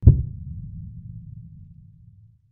Heartbeat Hit; Plays after successfully timing a heartbeat.

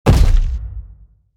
Figure Footstep; Footstep SFX

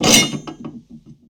Backdoor Lever

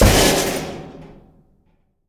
generator slam

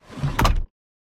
Drawer Close

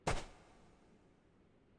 Footstep Handler Sand

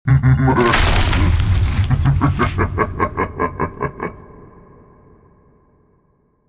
Grumble

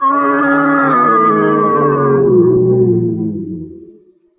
Death

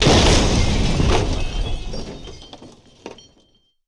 Bridge Collapse

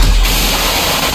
jumpscare RAH; Blitz

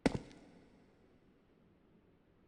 Footstep Brick; LavaMaker458

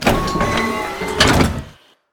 Door Move elevator

